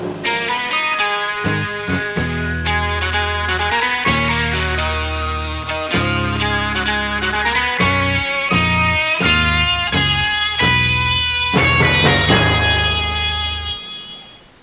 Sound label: fanfare